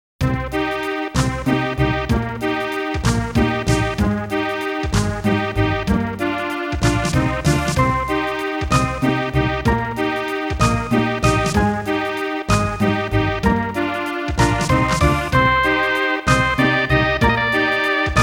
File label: Waltz; energy breaker game sound legend series